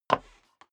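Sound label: step dress boots wood creak single stair up, footsteps; schritte; menschen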